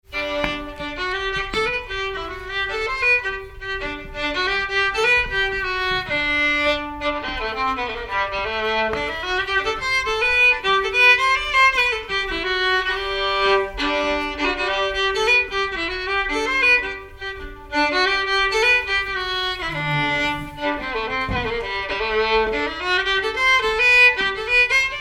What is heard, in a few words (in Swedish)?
polska; Bingsjöpolskor